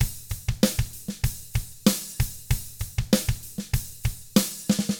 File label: HARD ROCK, Drums; 96HARD ROCK